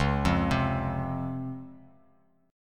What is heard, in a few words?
C sharp Major 7th C sharp; arpeggiated; chord; x,4,3,5